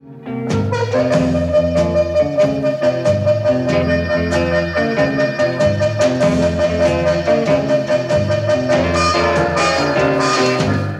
Staccato, harp

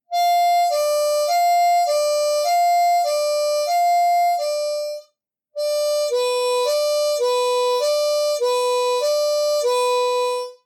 Fire Engine Ambulance, harp

